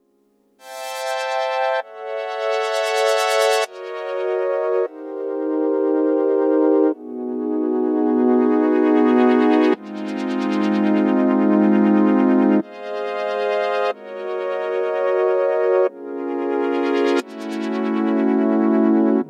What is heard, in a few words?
SH-4d Model Chord Fade Chord